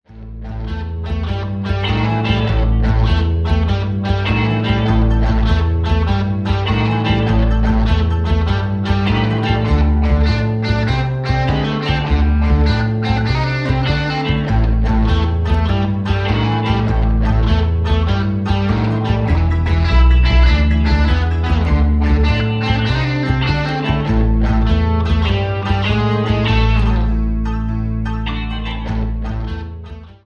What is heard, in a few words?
Blues Shuffle